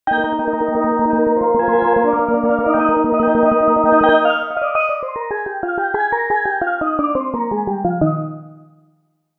Steel Drums; SP4; Perc

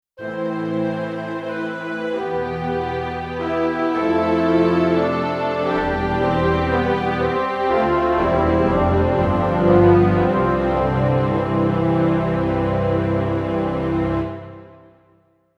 Pastoral Orch; PC3

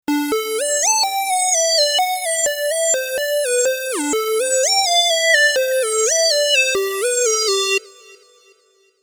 Lead, Leads; PC3

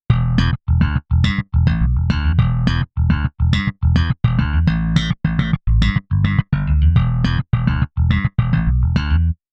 Slap Bass; Basses; MP10

